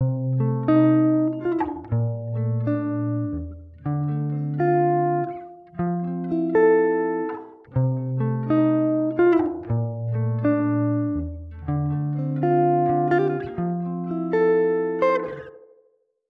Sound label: Spring reverb example, guitar